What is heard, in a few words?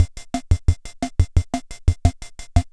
Yamaha PS-300 Bossa Nova